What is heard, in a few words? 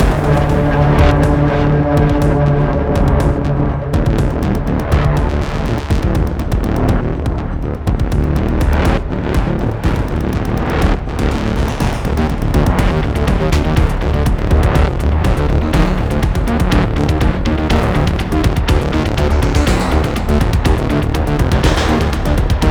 pulse